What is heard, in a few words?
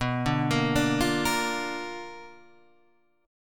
B-Minor Major 7th Flat 5th B down